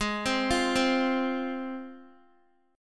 G sharp 6th G sharp down Guitar, chord; G#6; arpeggiated

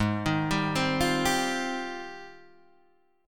G sharp Major 13th, Guitar, DADGAD

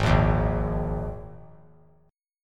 Ab Suspended 2nd; strummed; JGuitar

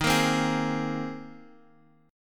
C Minor 7th Sharp 5th Eb

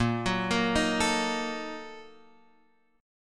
A sharp Major 7th Suspended 4th Sharp 5th

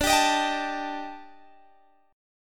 Eb Major Sharp 11th D

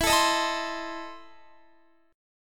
E Major 7th Suspended 4th Sharp 5th, Guitar; EM7sus4#5 strummed

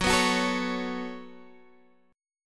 C sharp 7th Suspended 4th Sharp 5th, F sharp

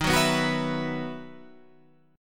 E Major 7th Suspended 2nd Eb

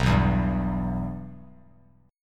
B Suspended 4th Sharp 5th B down, Bass Standard; Bsus4#5 strummed; JGuitar

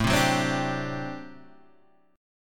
G Major 7th Suspended 2nd Suspended 4th A

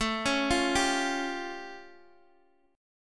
A Augmented 7th Guitar Open G; chord

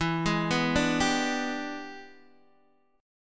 E 7th Sharp 9th Guitar Open D, chord

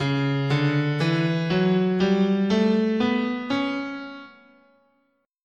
Db Locrian, scale

